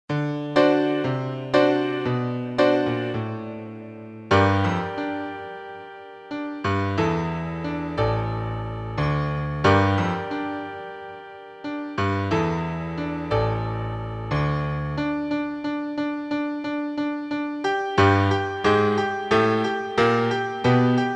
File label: Munchkin Musical Sequence Ensemble accompaniment; The Wizard of Oz